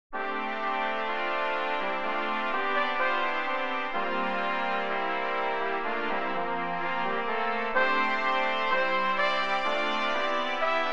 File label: fanfare for a squirrel